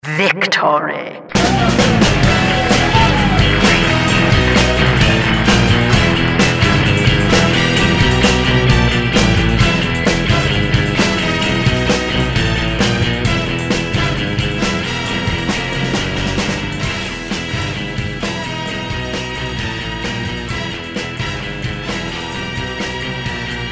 match end win music casual

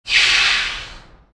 car passby; Garry's Mod; tf2; mvm warehouse

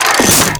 reload; cow mangler

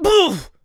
shove; Garry's Mod; tf2

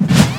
heavy upper cut; taunt; Garry's Mod; tf2

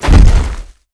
strider skew rock dirt; dog v strider; Garry's Mod

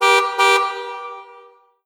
car horn; Garry's Mod; tf2; mvm warehouse

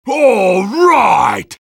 sniper cheers; vo

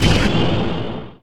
magic missile; skibidi wizard

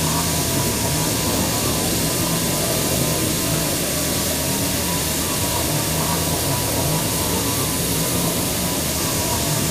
catnap spewing gas loop; nightmare criters

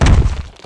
walk; tiny

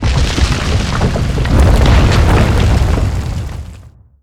rockfall; the monster plane; kolmods skill

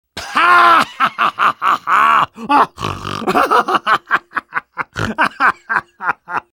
spy laugh long; vo

